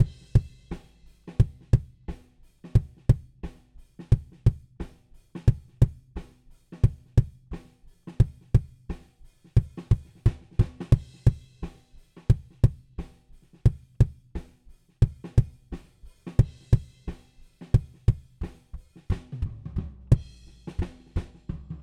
Kick Chorus

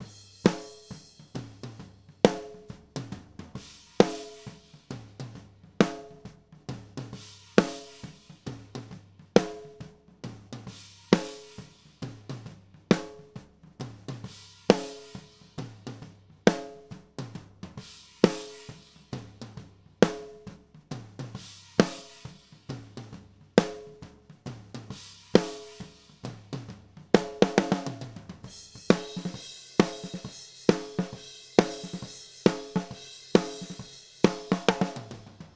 Snare

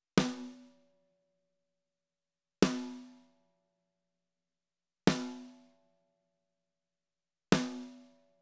Snare Fet III; Daking

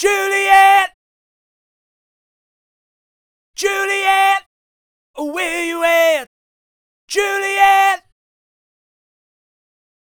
lead vox chorus

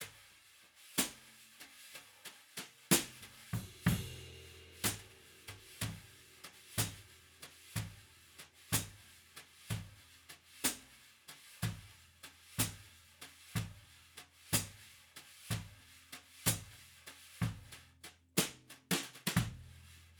TubeTech EQ OHs

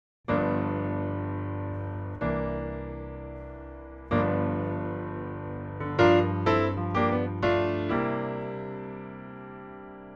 Piano

